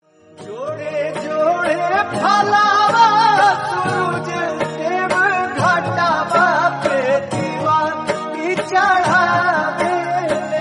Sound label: Chhath Song Ringtone